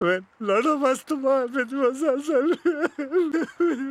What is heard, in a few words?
Crying audio; Akshay Kumar; Deewane Huye Paagal Movie Meme Templates